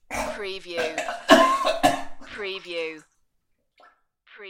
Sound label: Vomiting